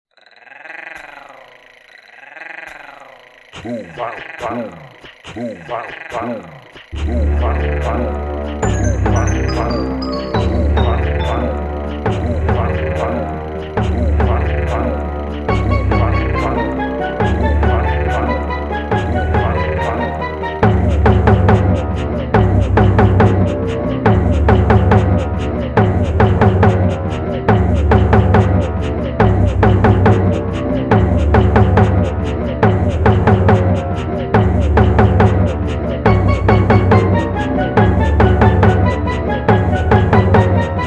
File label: tribal